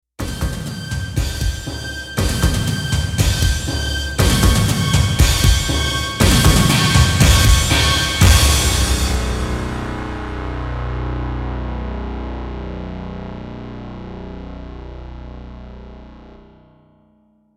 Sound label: Roland SC-8850; Doom 2; Doom 2 Title Screen Theme; Doom 2 Revisited